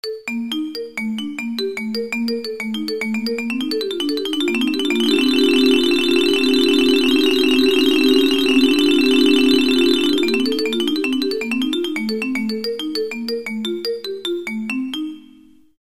Texture, Multi-event Texture with chord changes, Single marimba stroke; This multi-event texture begins with widely spaced events; TEXTURE SIMPLE; CDP Function: TEXTURE SIMPLE